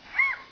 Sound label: gull